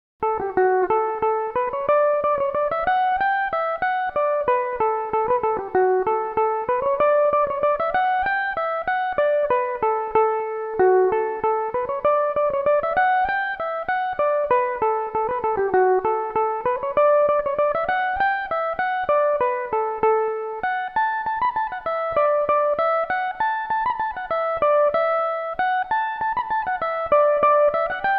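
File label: D major, mandolin; Belmuse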